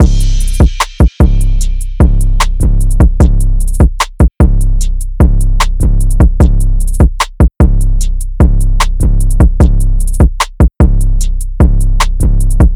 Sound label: 150 BPM Cm; Hangout